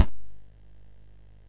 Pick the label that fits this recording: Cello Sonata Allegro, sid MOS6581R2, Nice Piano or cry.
sid MOS6581R2